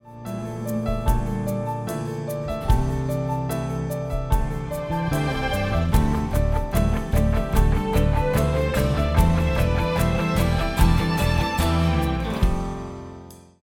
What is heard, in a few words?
Accompaniment; Torrey